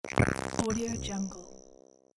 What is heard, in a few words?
glitch scifi